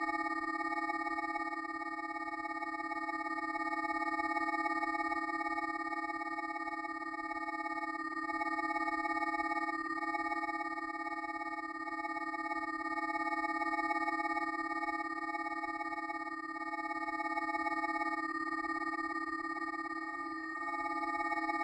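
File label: drone hover distant loop; Titanfall 2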